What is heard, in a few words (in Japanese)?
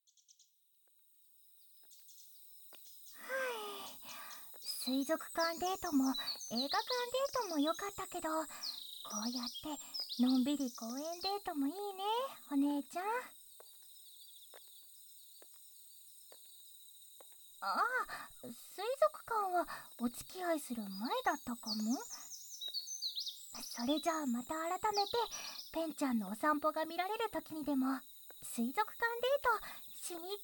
耳かき; 公園デートも悪くないかも♪; 妹みたいに可愛がっていた幼馴染と